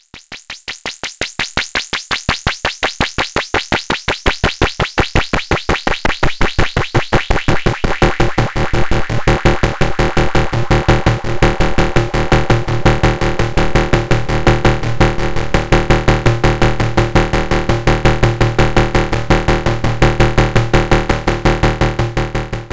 External In MI Plaits; Body Synths Metal Fetishist

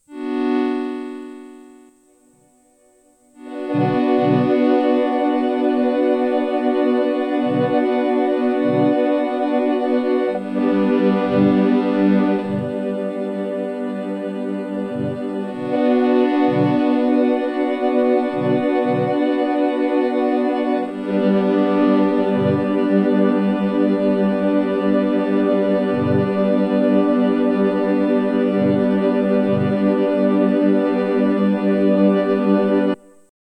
Microfreak Freeze und Pitch Error; Chase Bliss Onward

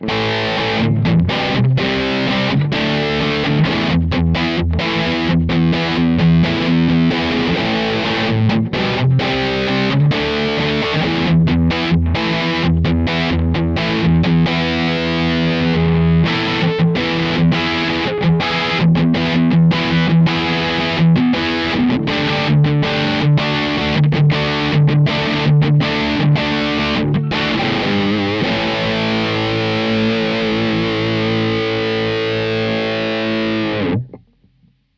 Blackstar St. James; Liquid Profiling; Kemper Generic

